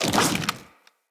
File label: Root Spider Mother Foley Leg Swipe